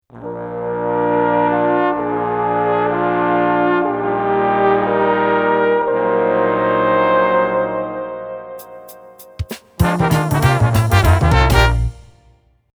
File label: tune; Tenor- en Bastrombone - Klassiek; Jazz; Trombones en orkestband, Opname West Sound Studio